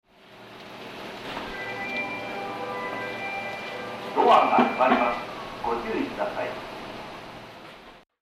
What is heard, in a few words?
melody, station